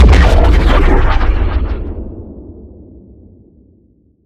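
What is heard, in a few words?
Sci weapon Shot Large Gun Gravity Turret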